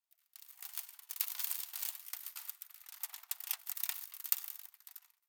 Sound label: sand auto; ambient; dust2